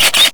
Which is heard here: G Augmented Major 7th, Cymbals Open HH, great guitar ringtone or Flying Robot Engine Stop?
Flying Robot Engine Stop